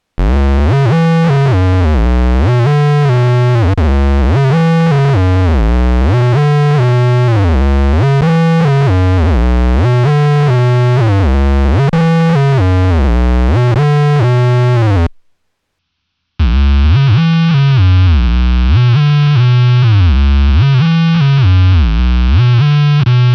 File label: octavius squeezer vco, bass